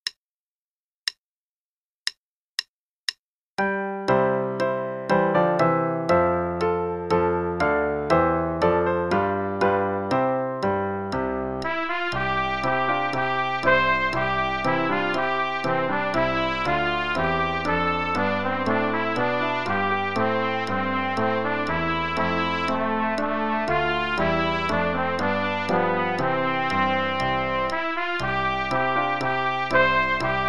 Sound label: Play-a-long, both parts, qn=120; Belwin; Bouree; trumpet